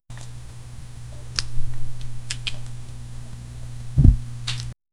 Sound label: snatch